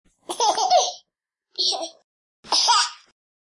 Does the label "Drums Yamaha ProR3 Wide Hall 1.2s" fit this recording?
No